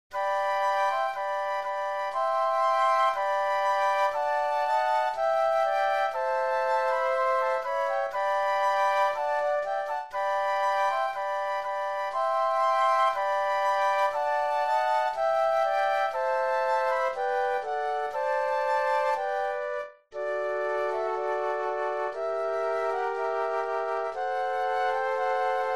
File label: Chorale Flute Trio; classical; Haydn; St. Anthony